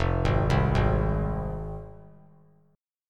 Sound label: F Minor F down; Fm arpeggiated; JGuitar